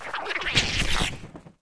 moscutter dead, monster

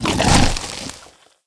ice nipper dead, monster

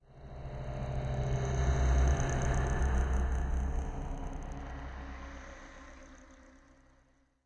vampire, undeadtower

